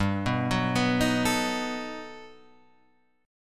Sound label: G Augmented Major 9th, Guitar, Half Step Down